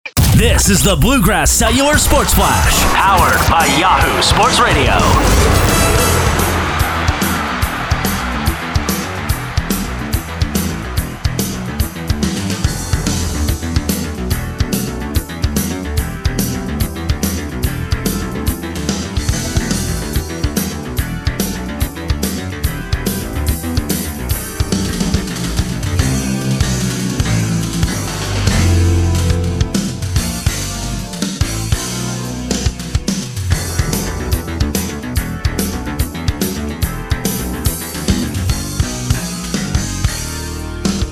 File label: Bluegrass